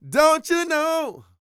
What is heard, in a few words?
SING, Vocal Planet R&B & Dance; ILIO